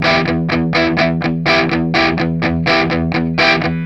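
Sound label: RHYTHM 125, Vintage Blues Guitar; USB Soundscan vol.22; AKAI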